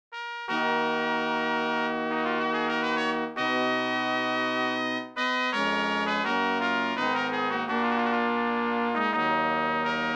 3 sagbutts 2 cornetts; Locke Air

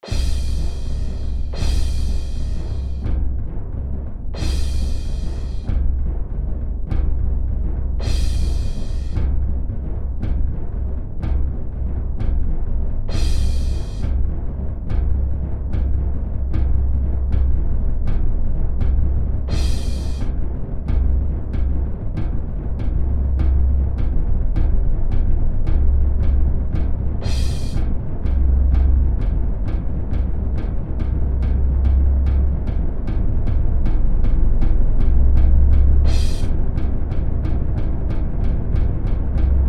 percs orch; fib t one sixteenth

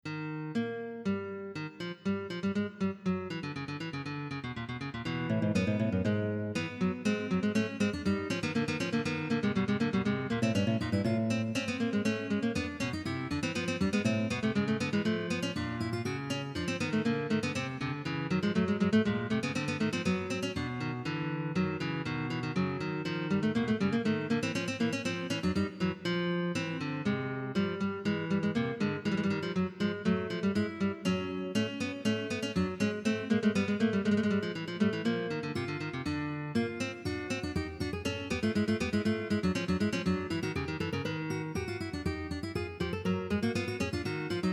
34edo Guitar; Padanian Canon 2nd version; maestro velocity

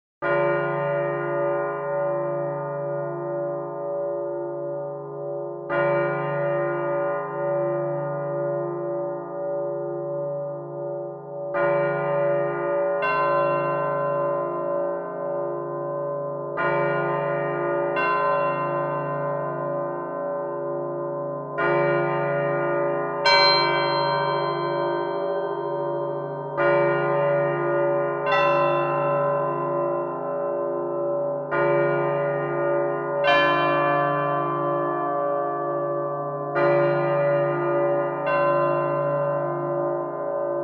bells 17edo

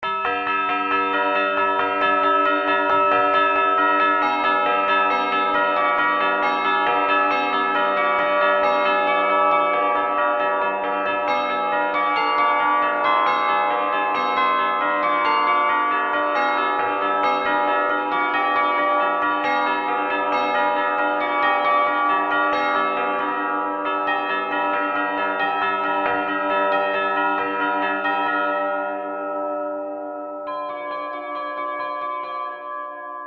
bells harmonics 24 to 48; bell tuning compare

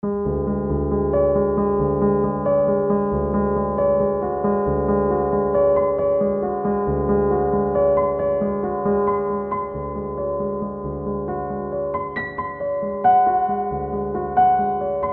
piano no octaves; bell tuning compare

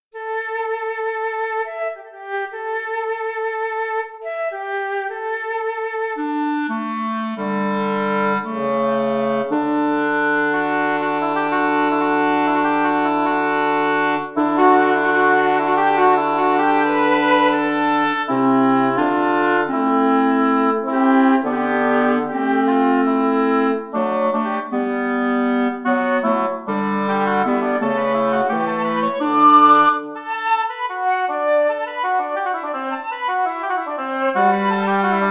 blue ji winds

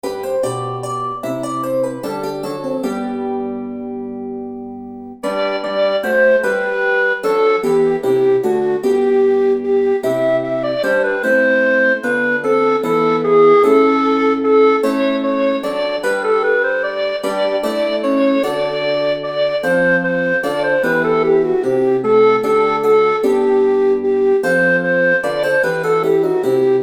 flute harps, quarter comma meantone

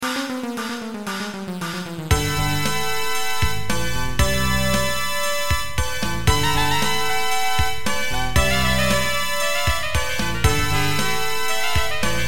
16 edt; Xenharmonic Alliance

